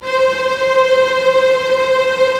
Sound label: STR, Roland LA Composer; Roland - LA Composer vol. 1